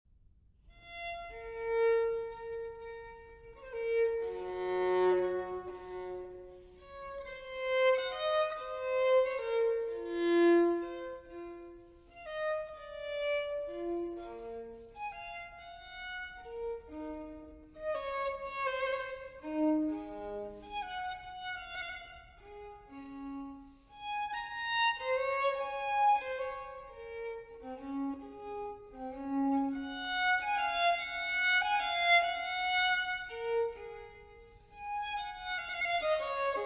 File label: Solo Violin in B Minor Siciliana; TWV 4022; Telemann Fantasia